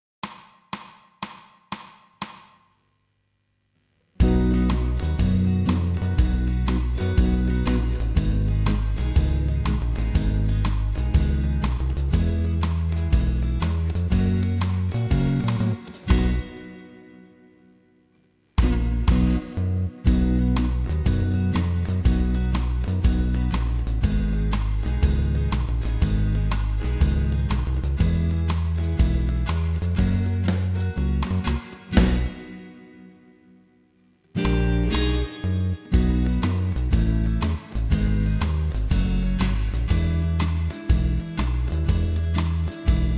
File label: Trambone backing